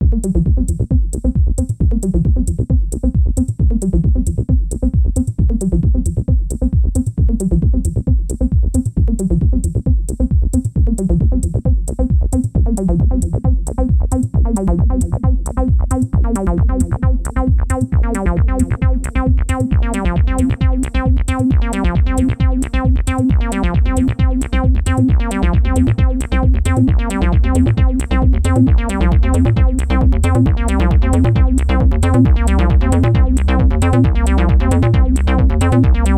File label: Akai S3000XL